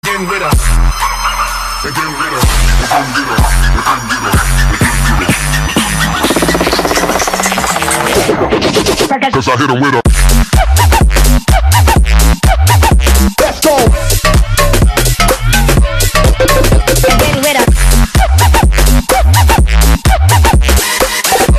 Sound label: trance, ringtone